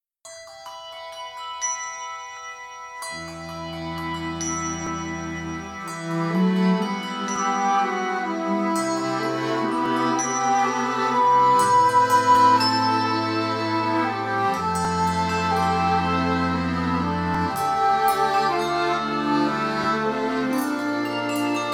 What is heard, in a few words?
Guitar